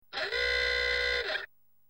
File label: KLAX COM, COMIC